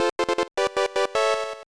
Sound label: Winner Jingle; super trump collection; snes